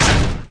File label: hitsound; Faith Plate; Mid-Simulator